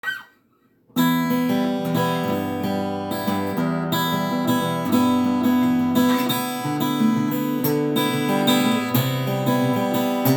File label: Acoustic